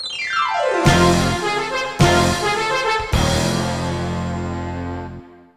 Ambient Song; worms 4 mayhem

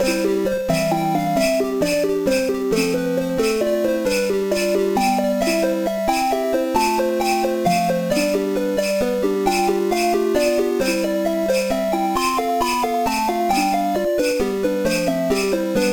Drum Island Field; one piece going baseball kaizoku yakyuu

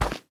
grass; anna; devour

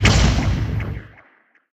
SPELLS Lash

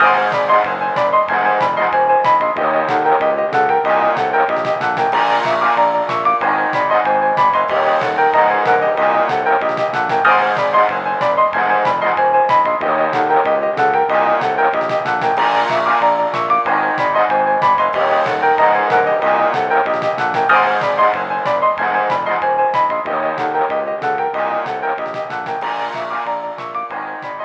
Ambient Song; Worms 4 Mayhem

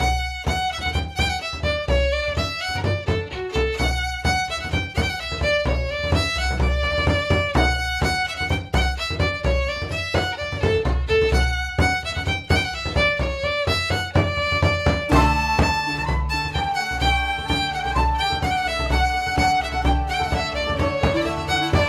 Orchestra Ambience; Assassin's Creed III

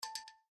Savanna Feed Discovered, windows 7

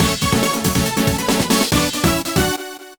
Jingle Graceful; bomberman 2; nintendo ds

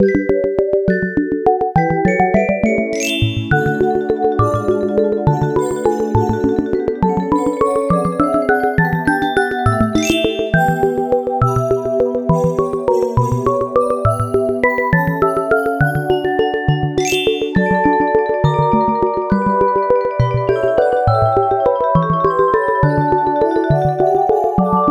Underwater Fast; gamerip

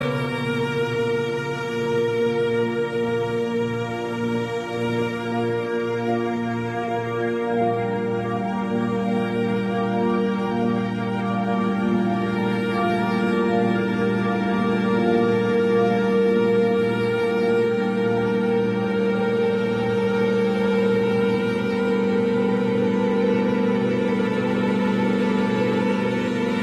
Tense Loop

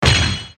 Magic Hit

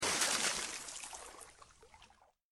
Water Splash

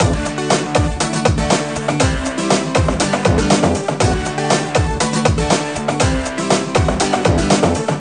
Disco; j4g a girl s world 2009 nds

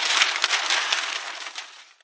paper fly; Angry Birds Seasons

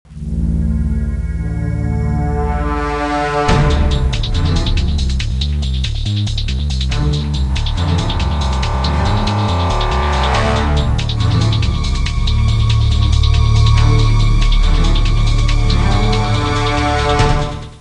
Jingle Lost; emergency kids 2011; nds gamerip

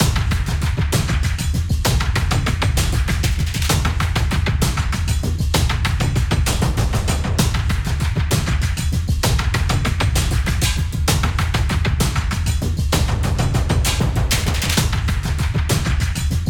Mus Action Perc High

